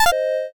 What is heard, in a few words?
eliminate